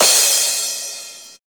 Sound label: crash single hit f sharp key, Royality free drum crash sample tuned to the F# note